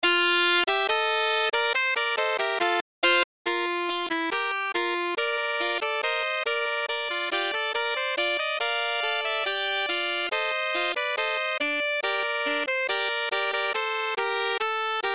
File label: Sax Trio